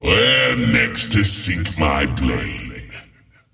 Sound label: swipe; skel arc; dota; skeleton king